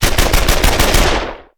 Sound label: Gun, Machine, M3, Short Burst; gun and weapon sounds; captain audio; fully loaded